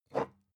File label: glass pick up smooth fast; objekte